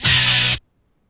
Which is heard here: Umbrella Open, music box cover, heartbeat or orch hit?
orch hit